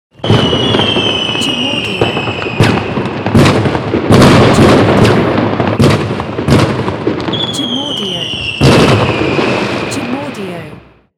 Fireworks

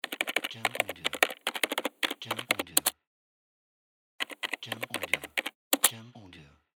Keyboard Clicks

